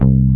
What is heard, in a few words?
125bpm Live Bass C